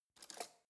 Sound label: cutlery spoon drawer grab